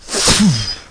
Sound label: mega spit